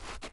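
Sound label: shuffle, footsteps hard surface jump up; dirt; grunt footsteps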